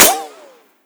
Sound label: ricochet; arccw